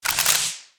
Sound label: Enemy Boss Discard Gun; Smilinguido